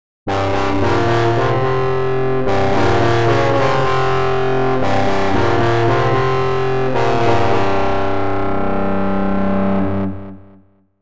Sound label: Black metal; overdrive demo; HV-Formant 1.1 Black metal preset